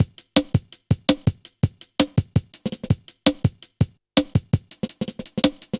Skippy, Drum Loops, Jungle

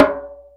DJEMBE A; Best Service Advanced Media Trax Modern Composer

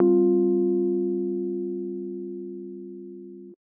125 Hard Synth Bass; PowerFX - Nu Jazz House

